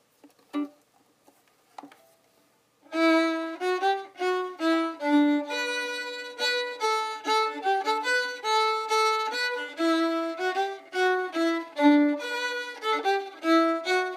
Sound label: Reels; Peat Fire Flame Quick; GFW Slow Session